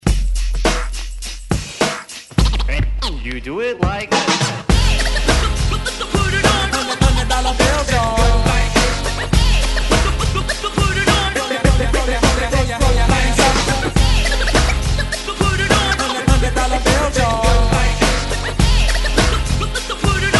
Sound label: Remix 90s Extended 103 Bpm; DJDOLLS